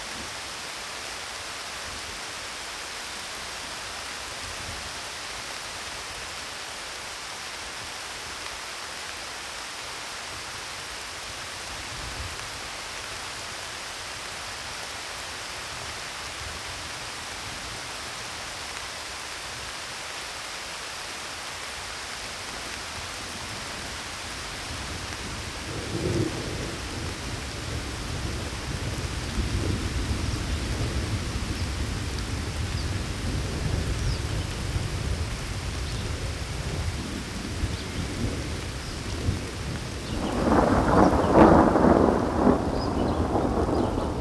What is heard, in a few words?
Thunderstorm